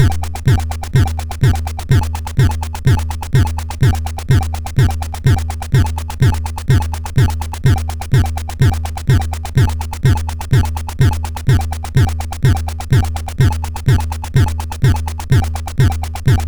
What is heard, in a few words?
Acid, MOS6581R2